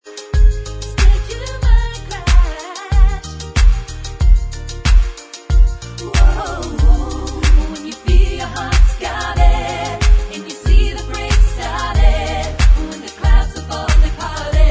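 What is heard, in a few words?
backing vocals Track, backing tracks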